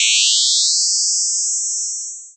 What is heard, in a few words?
sci fi; Masterbits - Switch FX